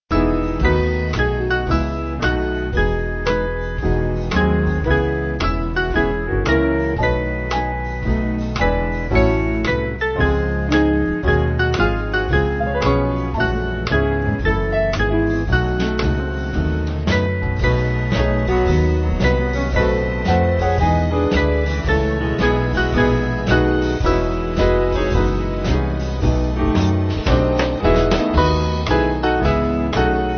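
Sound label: Band, Music Backing Files for Churches; Rejoice Ye Pure; Marion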